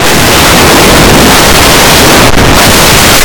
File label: Jump scare